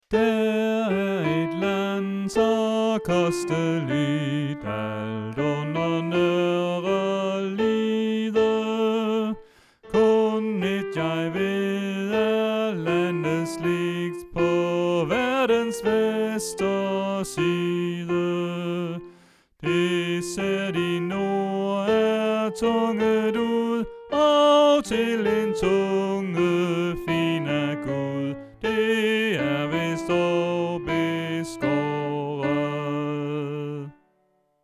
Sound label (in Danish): Sopran